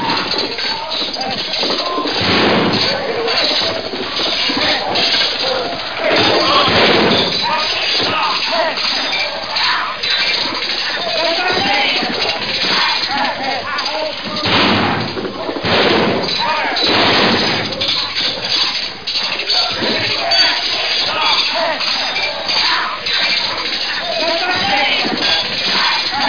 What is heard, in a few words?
melee